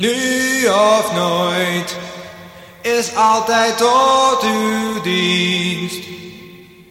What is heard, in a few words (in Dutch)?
Jingle a-capella kort; Nu of Nooit